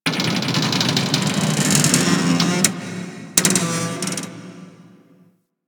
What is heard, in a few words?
Ocean Close Metal Creaks; Warframe; Grineer Ocean